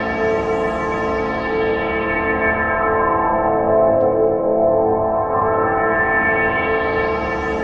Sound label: Ice Pad; Keys; Pads; Drum & Bass